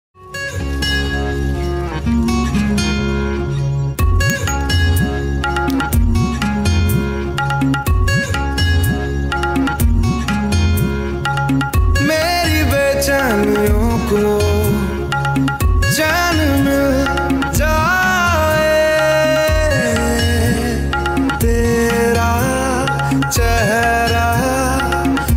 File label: Instrumental Ringtone; Hindi song; Harshvardhan Rane, Mawra Hocan; Sanam Teri Kasam